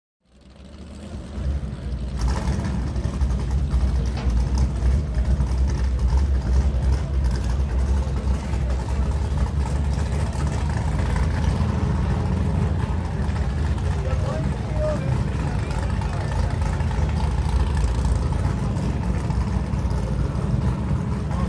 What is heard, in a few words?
Power Boats Pre Race, off shore power boat